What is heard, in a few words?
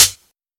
Verby Closed High Hat Sound G Key, closed hi hat sample tuned to the G note